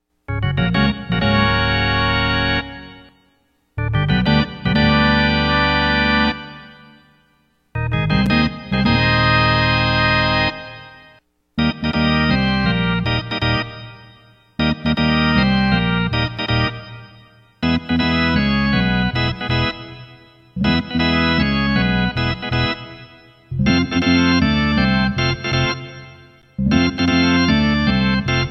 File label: organ